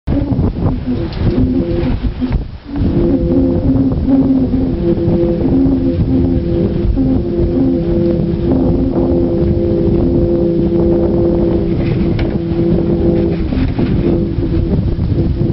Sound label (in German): Windschatten